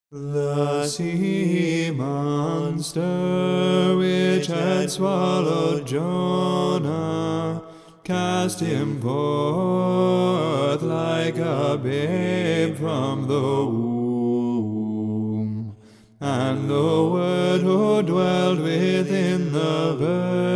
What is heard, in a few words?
Heirmos Tone I; Christ Is Born; Ode VI, Canon I